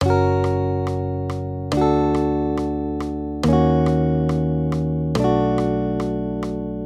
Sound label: maj triads